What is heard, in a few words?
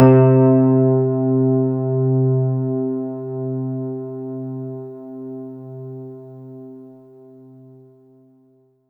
C3, Synth Line; 90 s Sample CDs; NiceBeats; SynthLine VOL-23